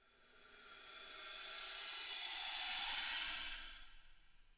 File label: Light Bulb Ambiance